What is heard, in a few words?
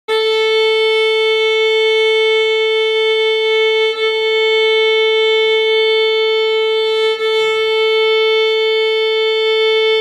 A Tuning Note